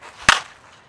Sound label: Baseball Bat Crack